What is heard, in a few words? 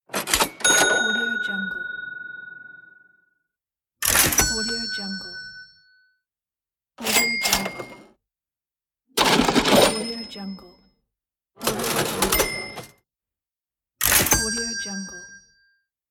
cash register pack